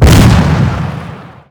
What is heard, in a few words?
gunnery big flak explode; Weapons; EK modern; Barotrauma